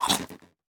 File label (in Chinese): 炽足兽进食声